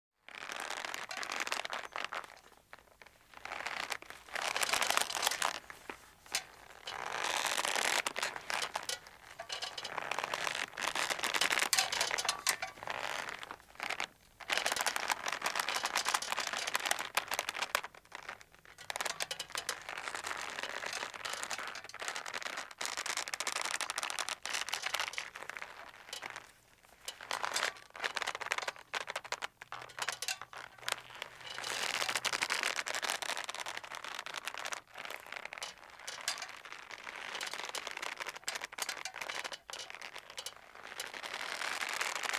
Rope Stretch's And Creaks With Metal Rattle; Rope/Metal; Rope Stretch's And Creaks With Metal Rattles And Creaks. Slow Rocking Boat.

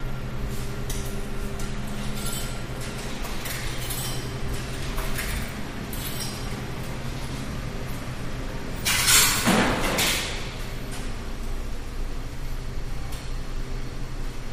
Hallway Noisy

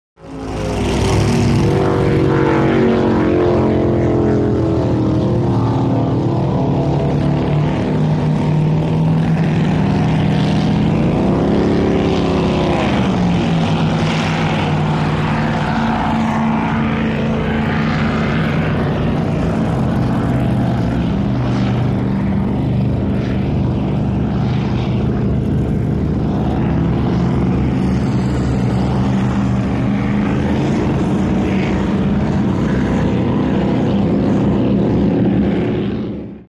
Power Boats By Slow, off shore power boat

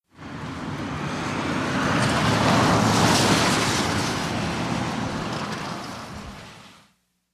Wet Traffic Wet Road

